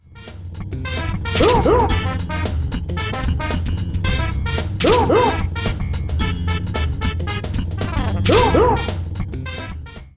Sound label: chomp